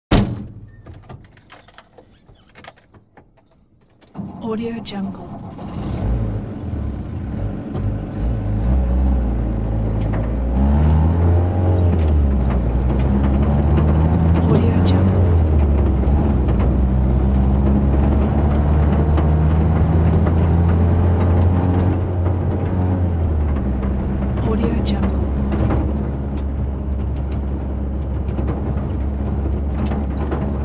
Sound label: interior of pick up truck with door slam and drive off; hollywoodedge